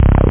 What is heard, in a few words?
303, tb303 samples